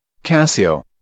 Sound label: casio